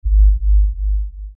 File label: Lfe Pulse Low Bass; Drone; Sound Design